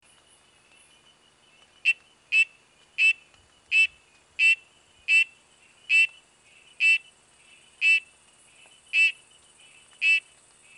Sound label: Oreophryne lemur